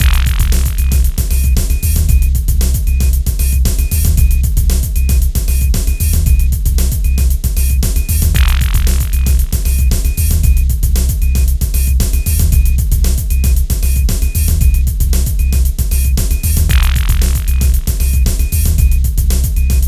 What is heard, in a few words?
Chase